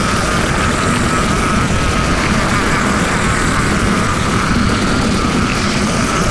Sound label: mother suction loop; finalmother